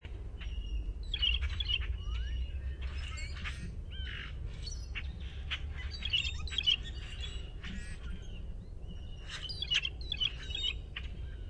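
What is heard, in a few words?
blue jay pumphandle, Cyanocitta cristata; Passeriformes Corvidae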